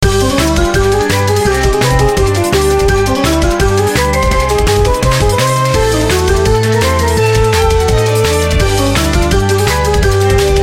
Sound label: bgm advertise loop; edzuputo flames; goodroid inc; android